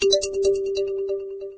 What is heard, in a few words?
new mail; snappymail